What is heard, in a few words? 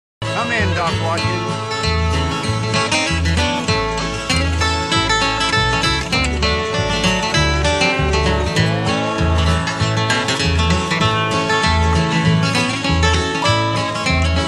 Circle Lead Break